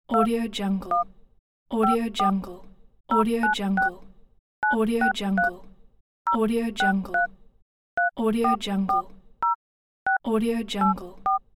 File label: dialing phone number